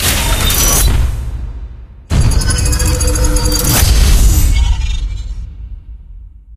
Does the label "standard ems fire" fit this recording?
No